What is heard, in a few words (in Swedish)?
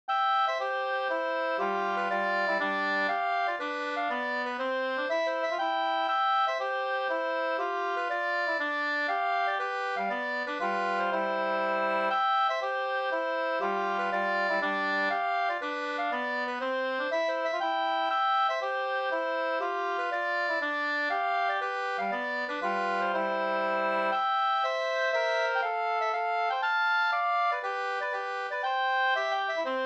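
polska, tvåstämmig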